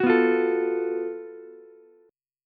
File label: F sharp Minor Major 7th Double Flat 5th, Ukulele; strummed